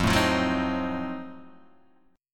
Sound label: F 7th Flat 9th, Guitar Open D